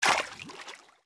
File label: FS Water Deep Wade, footsteps